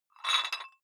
dumbbell take off rattling weight, fitnessstudio

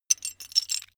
metal pieces clatter short

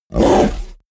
fishman groan short; kreaturen

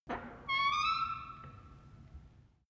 wood door old creak high soft

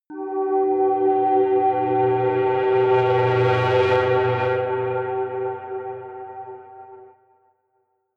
soft hum drone, drones

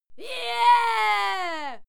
female cheer yeah throaty

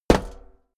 step walk metal hollow; schritte

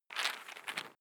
nut handling; haus garten; kueche

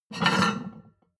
plate on plate take slide hard

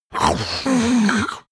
monster snort

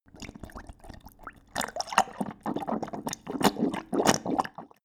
dog drink gargle; natur-tiere; hunde

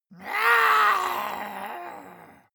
monster growl high; schreie

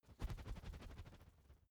feather flapping; kreaturen

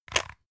pepper mill scrunch; gastronomie; soundpool

